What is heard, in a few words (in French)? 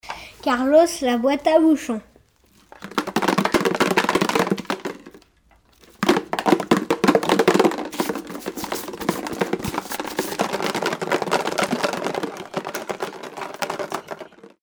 la boite a bouchon